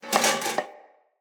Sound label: Foley Armour Body Fall C; Enemy; Mockeries